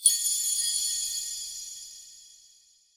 bell chime

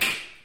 switch on

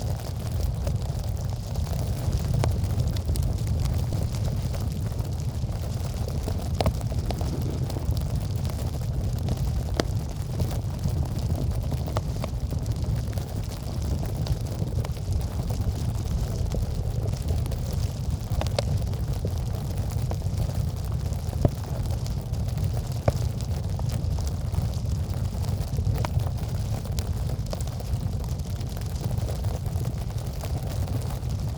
fireplace

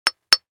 rock hit

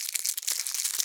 crumple paper

